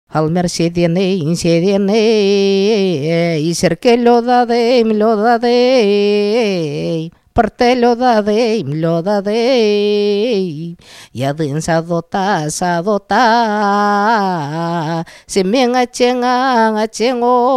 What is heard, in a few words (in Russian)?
Личная песня